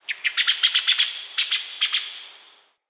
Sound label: Bird Alerted Thrush Call Far; Midrath Island; Ambience